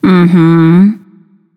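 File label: nonverbal agree; smite; voicepack; izanami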